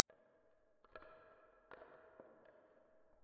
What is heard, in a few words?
Running Puppet